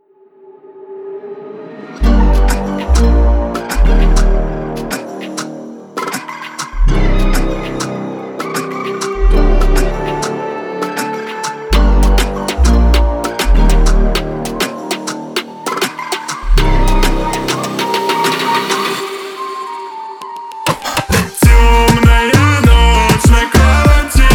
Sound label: Ocean Dive Dark